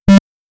a very short subtle error, blip

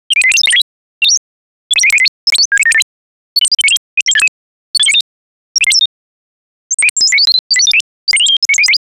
a bunch of different cute

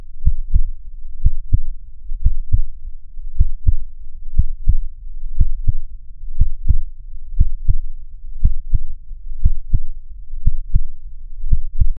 a non frequent heartbeat, beast

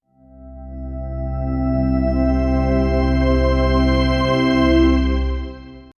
a rising motivational ins, background music

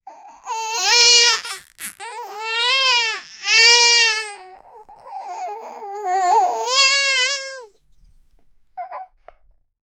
low baby crying and sobbi

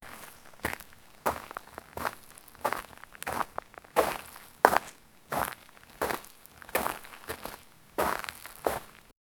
footstep of ground; earth

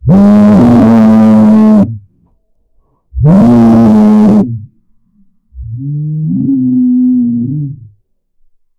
the sound of the giant, amphibian

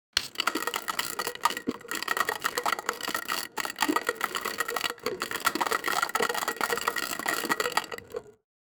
sound effect of, desserts

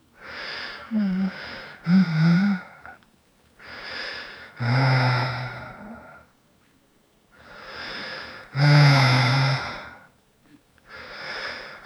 18 years old man holding, mouth closed

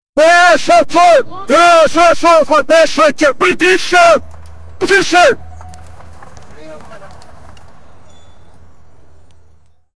pirate ships running, ships

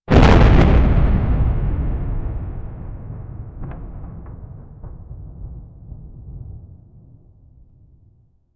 heavy stomps like thunder; animal

